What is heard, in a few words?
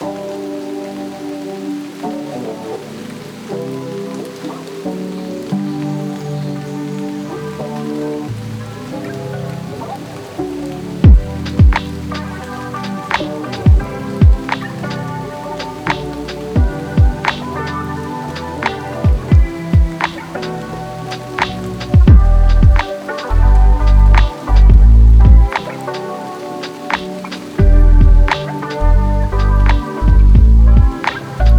Lofi Hip Hop Chillhop